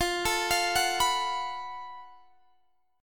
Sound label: Gb Minor Major 11th F